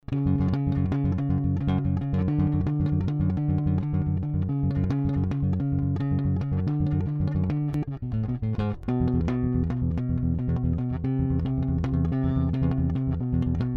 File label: Fender P Bass Melodic